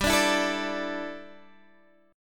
A Suspended 4th G; Asus4/G; strummed

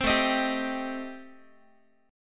A Minor 7th Flat 5th A, Guitar; Am7b5 strummed; 4A,4E,5A,5D,5G#,6C#; 15,17,18,17,x,x

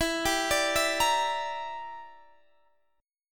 E Minor 7th Sharp 11th; arpeggiated; Em7#11

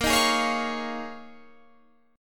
D sharp 7th Suspended 2nd Suspended 4th A sharp

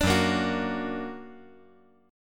F sharp Augmented F sharp; F#+ strummed; 7,6,6,9

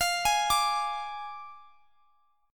F Minor 6th, chord; Fm6; arpeggiated

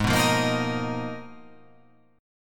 G sharp Minor 6th Add 9th

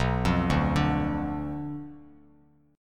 B Diminished B down Bass Standard

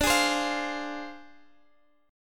D Minor 7th Flat 5th, Guitar, Open D

